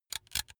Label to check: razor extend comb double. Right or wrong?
Right